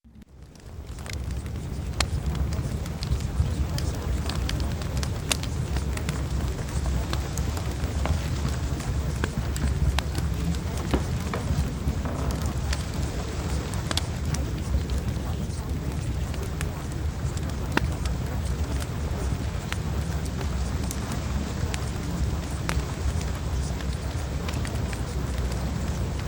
Fireplace

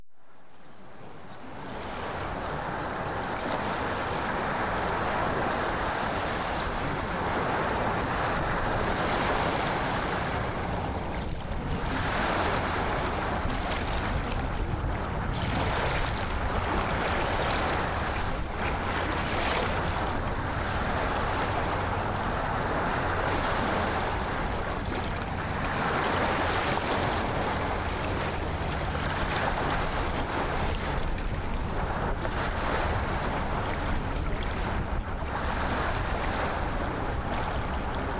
Ocean Waves; Reality Shifting

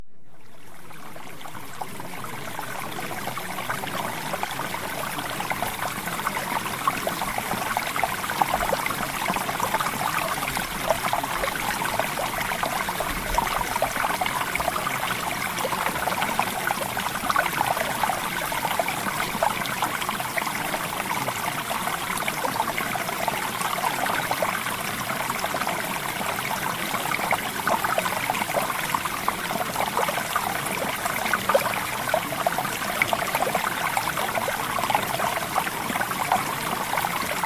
Water Sample; Premium Subliminals

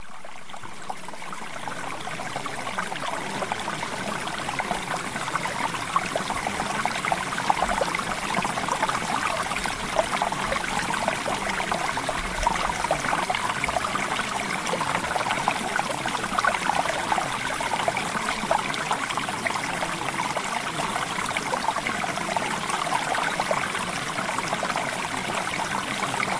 Water; Premium Subliminals

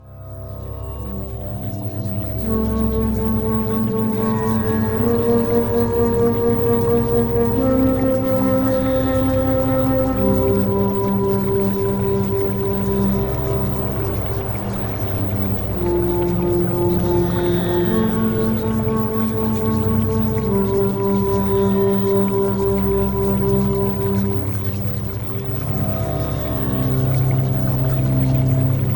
Music Theta Waves; Premium Subliminals

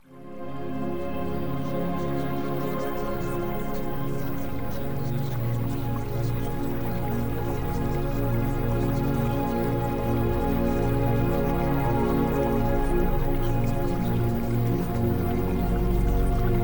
Music

